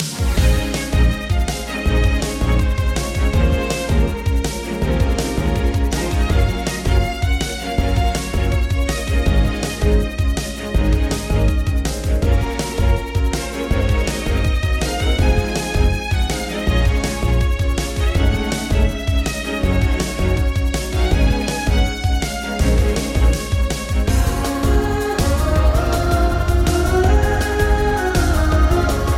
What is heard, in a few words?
Music Battle Soldier